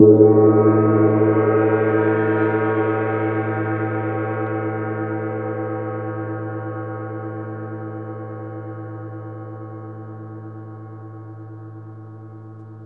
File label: Gong; Zero-G Ambient 1; GONGS